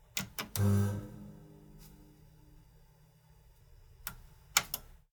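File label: CRT On Degauss Off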